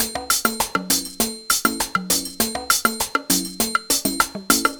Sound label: Perc, Miami Drum Machine, 100bpm, Drum Loops; Zero-G; Soundclash Nu Dance Electro; Acidized